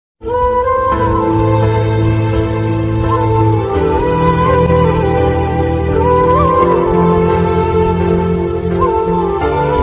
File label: sad emotional background music